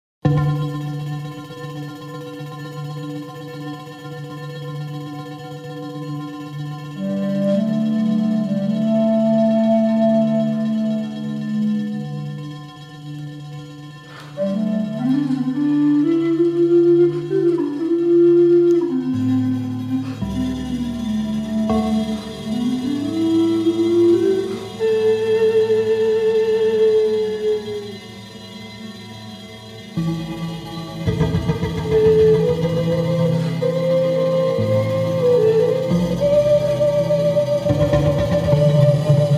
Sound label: rattle tine